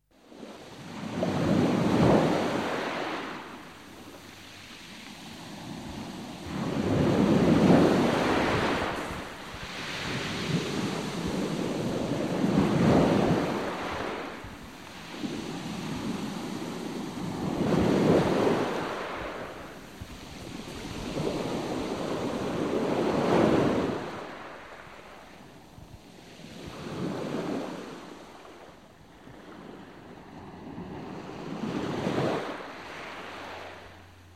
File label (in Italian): MARE RISACCA SPIAGGIA